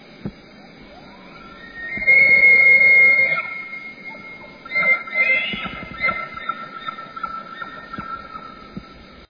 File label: Cervus elaphus; Chordata